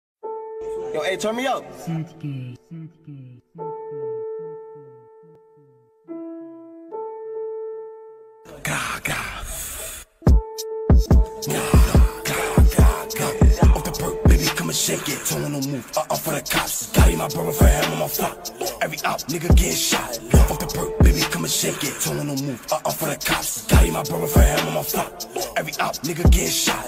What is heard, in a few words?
Perc